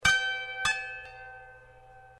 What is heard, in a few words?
chinese doorbell